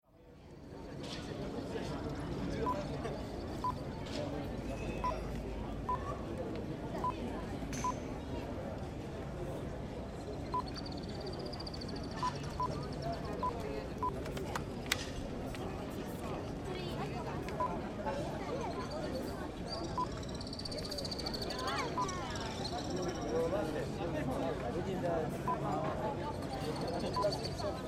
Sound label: Dubrovnik Street Square Ambience